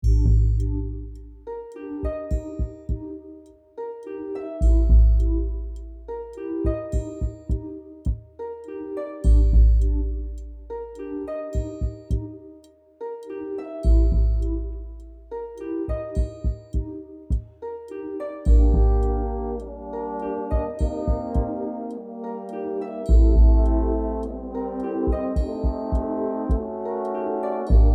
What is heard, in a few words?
Fear. Suspense